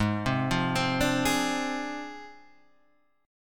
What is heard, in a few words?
Ab 6th Flat 5th Ab